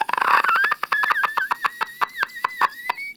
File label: Infested Runner Patrol; Warframe; Runners